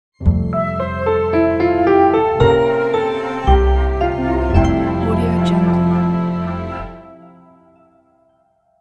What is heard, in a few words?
orchestral gentle logo